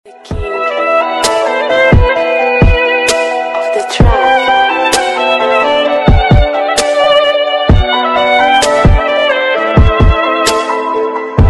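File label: Arabic Trap Beat Ringtone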